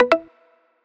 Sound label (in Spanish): alerta